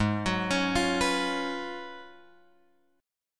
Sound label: G sharp Minor 13th G sharp, guitar chord; G#m13 Chord {4 X 4 6 6 7} arpeggiated